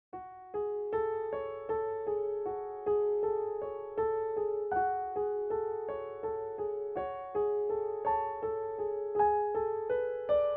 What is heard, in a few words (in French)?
tendresse pour piano